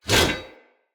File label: Whoosh, Swords; Weapons; Mockery; Mockery Turash